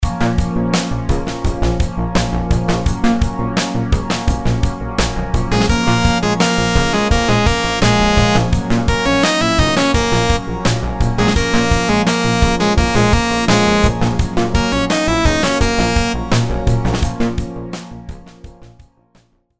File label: rhythms simple dotted 8s 85